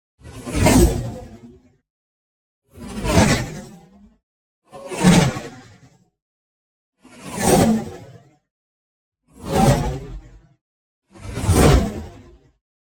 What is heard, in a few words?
WHOOSH LARGE FAST; Did You See That; boom library; Neon